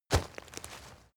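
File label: step leaves wet heavy, footsteps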